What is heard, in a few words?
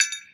glass, perc; eddyflux